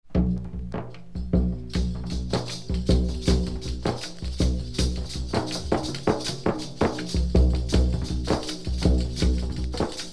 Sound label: islam drums